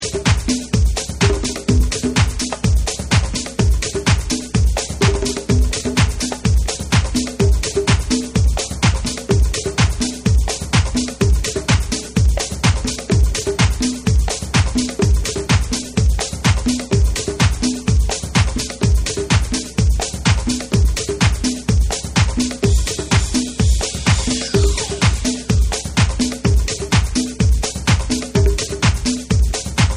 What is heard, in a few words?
Instrumental